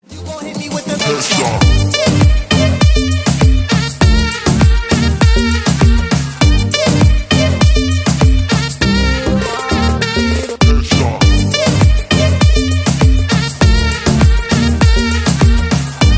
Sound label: headshot arabic ringtone